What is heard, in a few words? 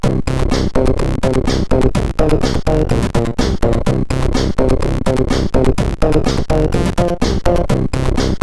Bassloop, CSG8580R5